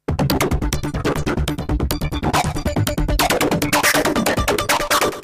modulated seq

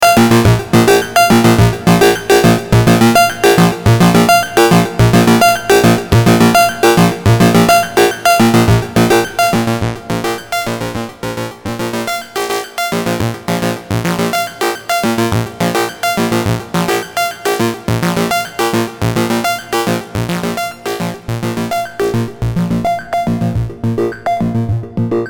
A-111 Hardsync + Hall

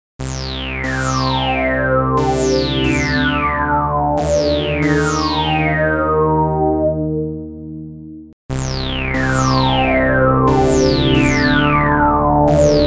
filter crosstalk

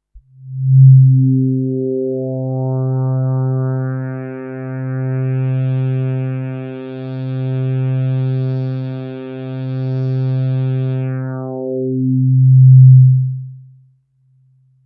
LP Filter Wah

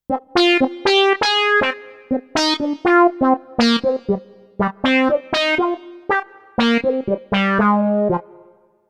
Yamaha Sonogenic SHS 500 Quack Lead

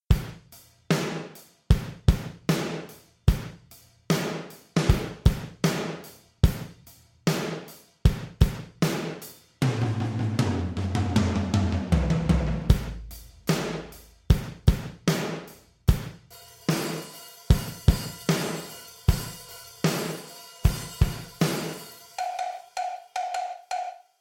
black kit gated

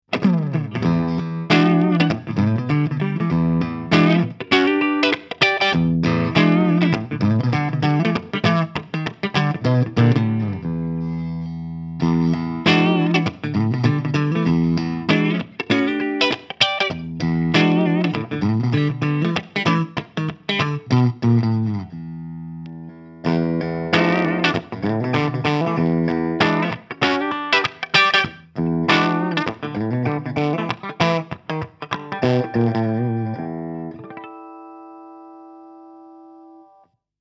Godin Summit Classic SG Clean Switch Move

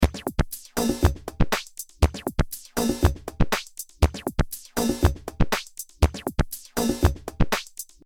Funky Loop

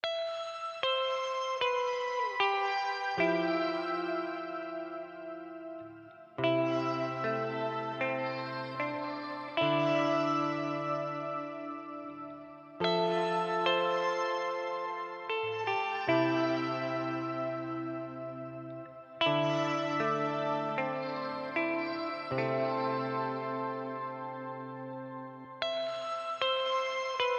Shimmer Reverb Source Audio Ventris

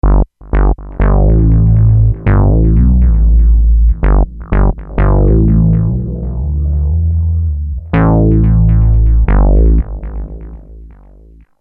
Minibass

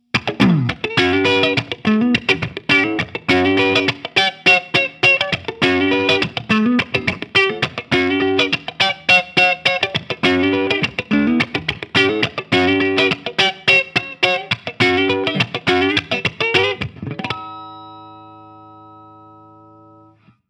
Dean Guitars ML Oceanburst Light Crunch

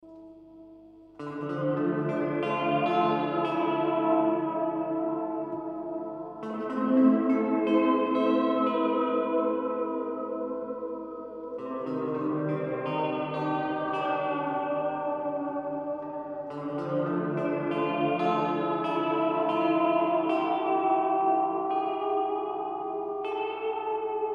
Plate Vibrato; Silverlake

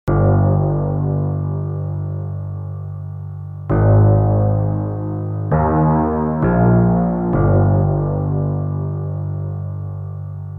Space Bass Pad; Proteus 1

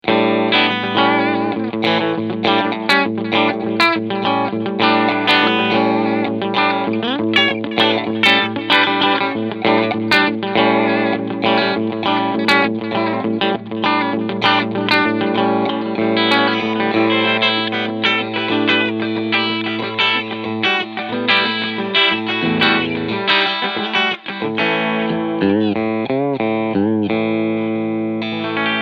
FGN Boundary Iliad RHS Cleansound Singlecoil

